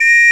Whistle 2 note Sus; Planet Earth; Digital Sound Factory Planet Earth

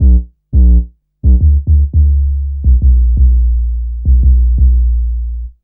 Raw Bass, Drum & Bass; Fluid Dynamics

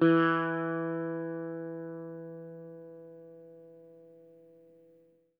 EL SITAR SFT; Quantum Leap - Guitar & Bass

